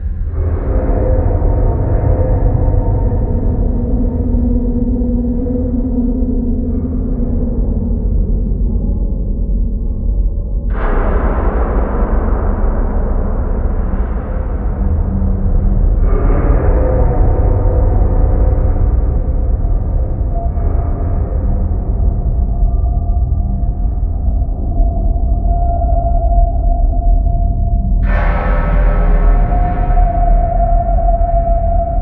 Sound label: Maintenance Amb Loop; moul